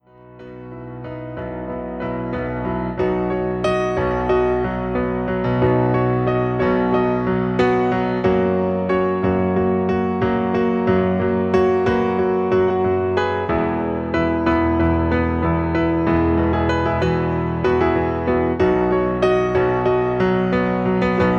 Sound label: (Piano), Professional soundtrack